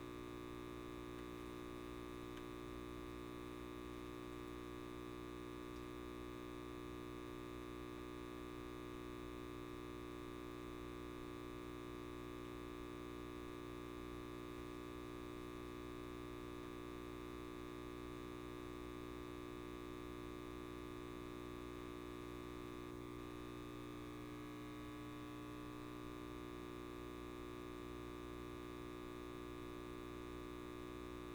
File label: Light Bulb Buzz, Flickering; Haunted Bloodlines; SFX Producer; Lights